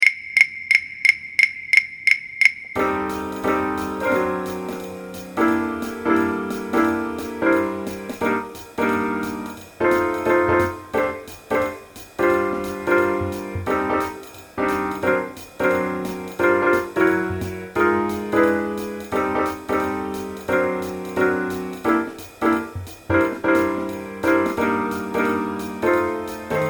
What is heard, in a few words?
no bass, hs jazz